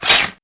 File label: BEATTACK